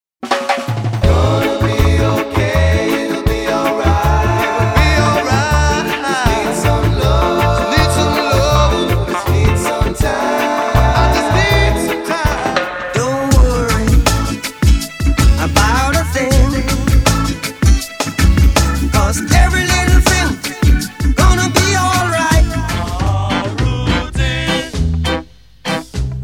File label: reggae